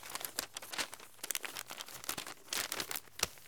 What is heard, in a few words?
Paper Airplane Fold; SFX Kit; Foley - Misc